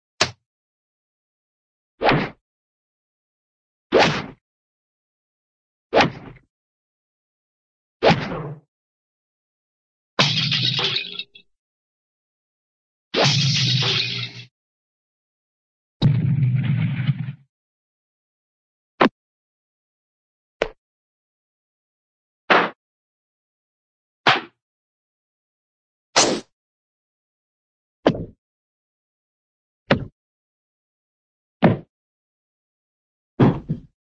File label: hit, cartoon gavel hit